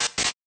Spark; A Robot Named Fight

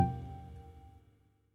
Lobby Loop Tail; Good Job

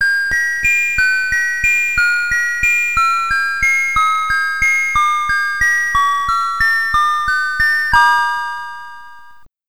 Game Over SID Stereo; Ghouls n Ghosts; Commodore 64

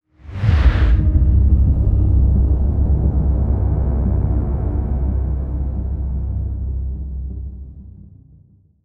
Tense (Stinger)

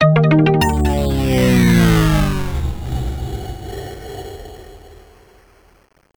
Startup

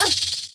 Iriki Recoil

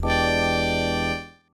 Eaten by Shark; apple iigs; King's Quest III: To Heir Is Human

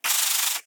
Spider Pain; The Inn

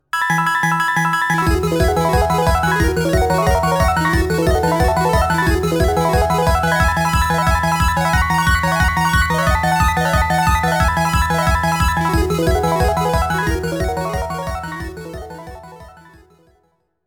Slot Jackpot; Pokemon Diamond and Pearl

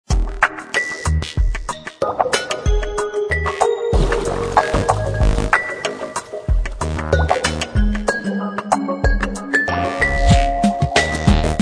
Level Music; Sewer

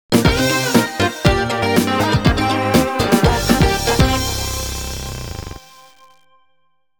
Level Completed; Ripple Dot Zero

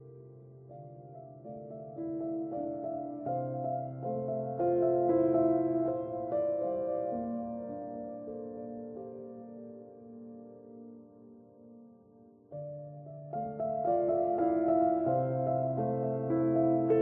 Piano Layer

